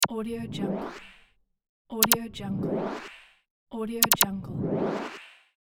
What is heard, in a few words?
glitch zap